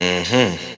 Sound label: mmm hmm, voice